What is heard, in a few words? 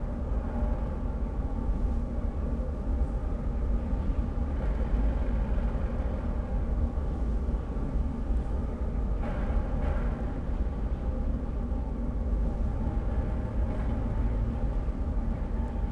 construction site ambience; Star Wars Obi Wan; XBOX